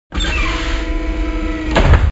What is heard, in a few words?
hatch, ambience